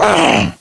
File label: Gore Pain, Ginger